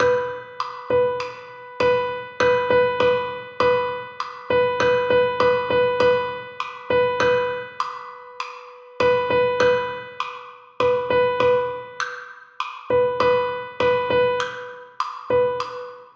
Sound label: Rhythm Exercise